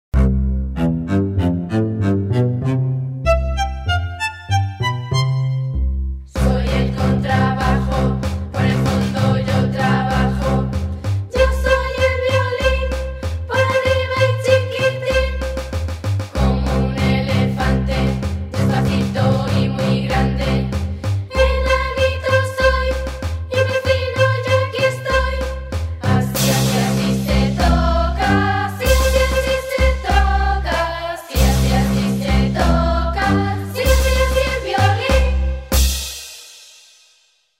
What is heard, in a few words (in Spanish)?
cancion Contrabajo violin; Canciones inf prim